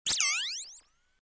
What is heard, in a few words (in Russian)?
zvuk vzmaha volshebnoy palochki